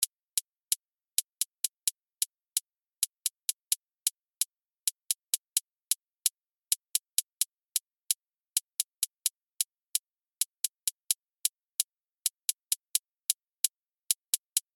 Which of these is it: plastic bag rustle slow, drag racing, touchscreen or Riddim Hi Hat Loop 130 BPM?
Riddim Hi Hat Loop 130 BPM